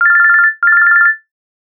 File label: ring